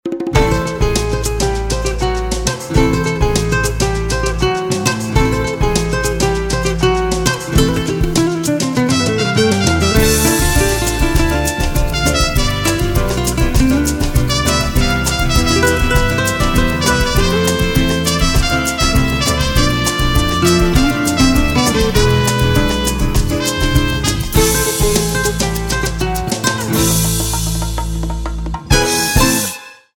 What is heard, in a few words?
SAMBA ROMANTICA